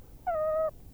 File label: alarm alert call